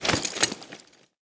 suit, footsteps

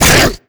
attack grunt, headcrab black; creatures; vox

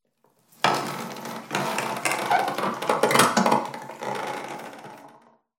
sanctuary wood; Half Life Alyx

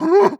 warning, zombie vox; creatures

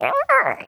mannequin grunt

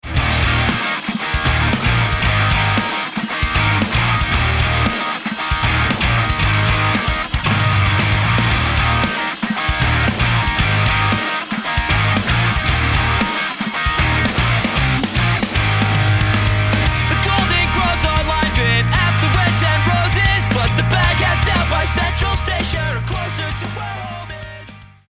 choose team, music

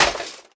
break metal cocktail shaker; physics; breaking

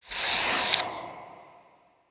spray; halloween

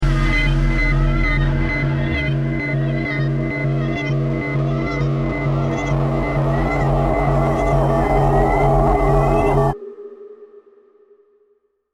round ten sec count, music

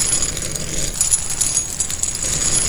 chain scrape rough loop, metal; Counter Strike Model Pack; CS:S

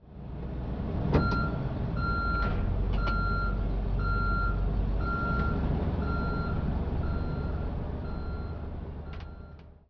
truck backup; Counter Strike Model Pack; CS:GO

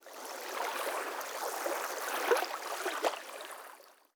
river lap; ambient; anubis

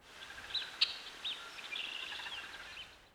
crane chatter; ambient; anubis

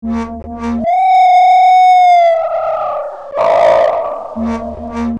hunter scan, ministrider; npc; Half Life 2; Episode 2

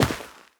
step, footsteps, player, carpet

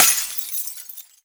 break glass phys small; physics; breaking

